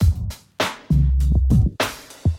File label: Groovy Drum Loop